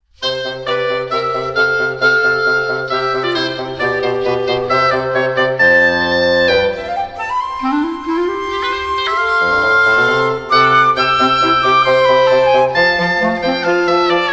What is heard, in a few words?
jingle